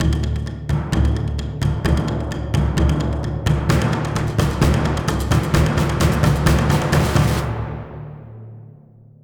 Combat Intro; Skellige - Ice Giand Island

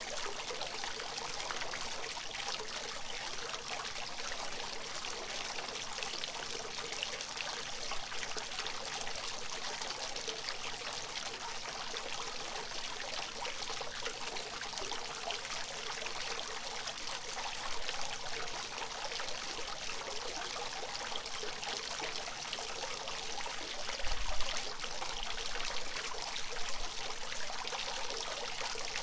Mood Water Flow; Phoenotopia Awakening

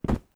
Impact Generic Light

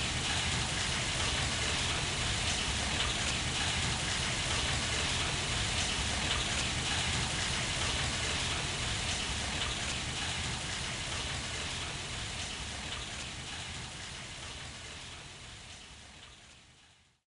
Sewer Water; Urban Trial Freestyle

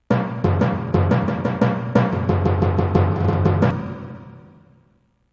Timpani (ten notes); Harvest Moon: Magical Melody

